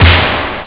fleet, drums snare; OctaMED SoundStudio V1

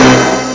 Big Bang

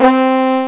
Bugle; HyperComposer

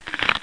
dino eat; Dino Crisis

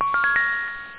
arpeg

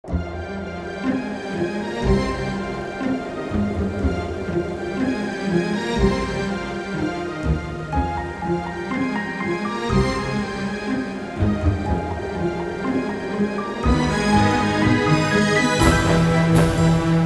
music; wob2 demo

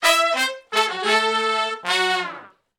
Shuffle Blues Riff (Ab) unison, horn; horncraft

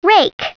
RAKE

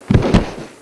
COLLAPS; Dark; thiefdg

